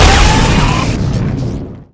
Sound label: shock rifle alt fire